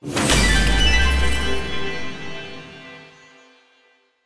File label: Explosion FX - GMaj CMaj, Video Game; Hero Death; Cymatics; Video Game Sample Pack Vol 1